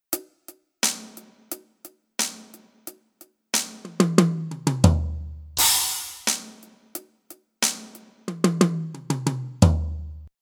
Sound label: Millenium MD 100 Mobile Drum